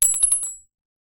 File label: cart